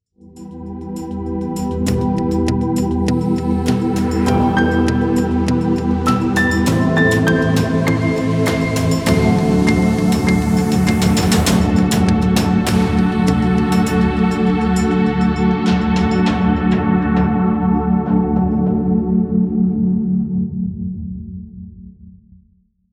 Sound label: Background music for tv commercial logo intro